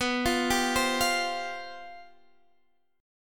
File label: C Suspended 4th B; Csus/B; arpeggiated